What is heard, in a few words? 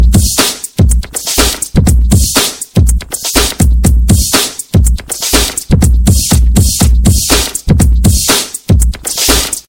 Beat